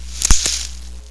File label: leaf snap, plant